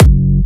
Jump Kicks, Bassdrums

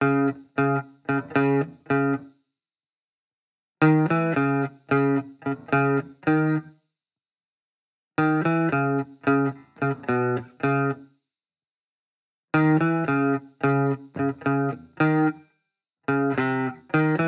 Guitar Bpm110 Key C# minor, 70s Guitars; Fox Samples; Back to the 70s Guitars

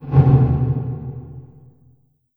LH MT909, REVRB LARGE HALL; TR 909

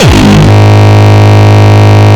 Longkick, kicks

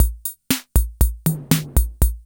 FILL, KR55; INIS - Funky Xtreams; KR55 LP4

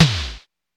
TOM, Roland; Hybrid Kits; Club-50 - Foundations; Thin

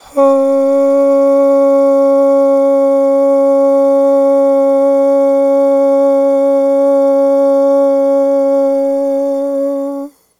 AHHH, Vocal; Polyend

